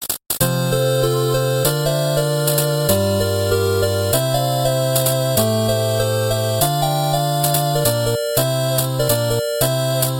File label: 8-bit